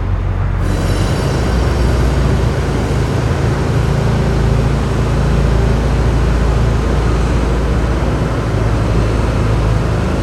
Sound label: Room Outside Day